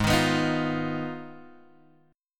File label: Ab Minor 6th Ab, down, Guitar, Open G